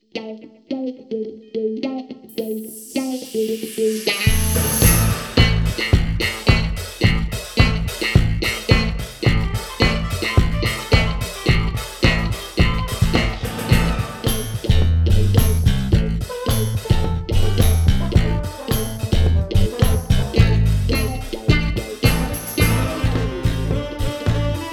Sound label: Disco Fever